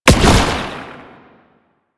Proto Infested Brachiolyst Teleport Start; Warframe; Abilities

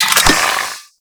Secondary Mech; Weapons; Cannon; Kuva Zarr; Grineer

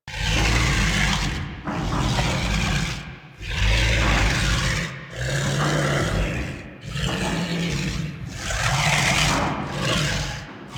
Vocal Struggle Loop, Enemies; Warframe; Teralyst; Ropalolyst

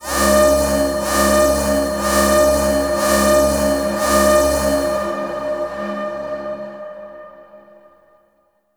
Wave Alarm; Warframe; Missions; Zariman; Zariman Assassinate

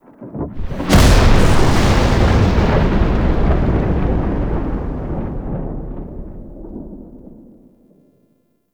Murmur Sky Thunder Clap B; Warframe; Ambience; Duviri

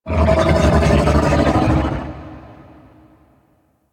Golden Maw move; Warframe; Ambience; The War Within

